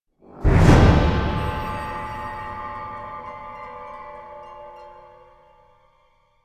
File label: MUSIC STING; LVL 11 NEUVACITY A